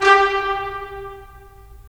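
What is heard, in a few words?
brass trumpets staccato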